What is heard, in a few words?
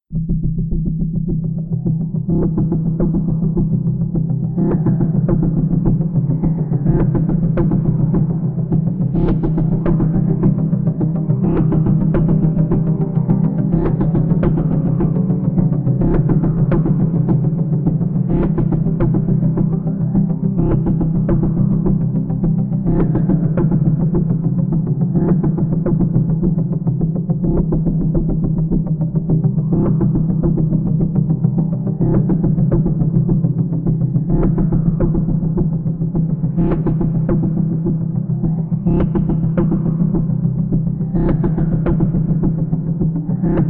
loot ambient pulse; heroes of the storm full gamerip 2015